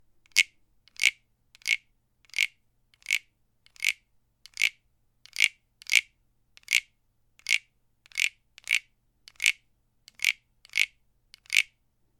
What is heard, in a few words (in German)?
frosch klein